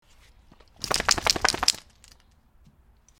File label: dog shake; SNARLING DOG